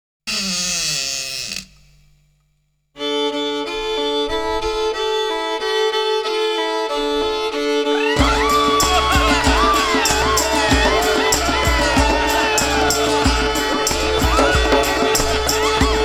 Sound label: Djembe